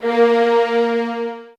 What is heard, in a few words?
SOFT VIOLINS